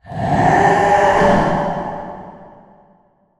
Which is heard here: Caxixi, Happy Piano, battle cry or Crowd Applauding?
battle cry